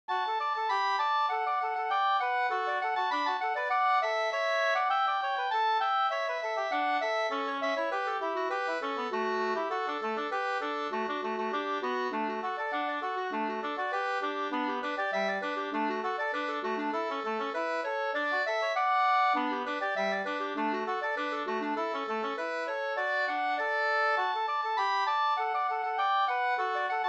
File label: polka, polkett; Slatterpolkett Da 2st